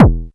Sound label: Rusty 909, Kick Drums; Zero-G; Soundclash